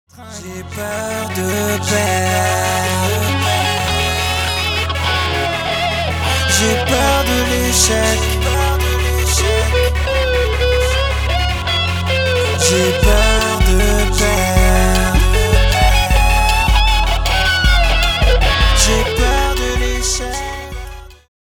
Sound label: solo